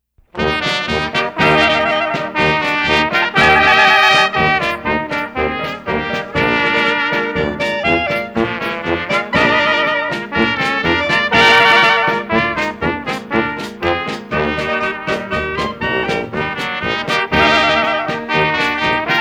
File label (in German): Laendler; Sauk Prairie